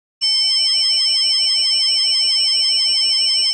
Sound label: C10 violin